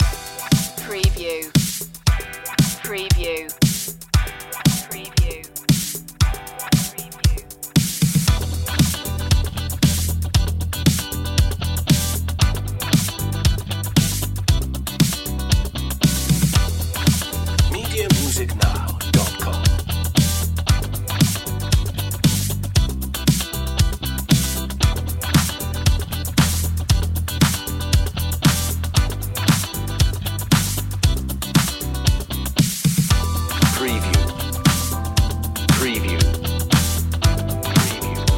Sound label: daft funk no vocoder